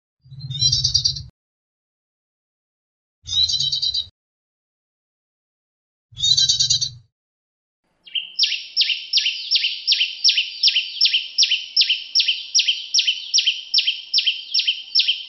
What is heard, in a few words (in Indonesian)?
kombinasi cinenen pisang dan belukar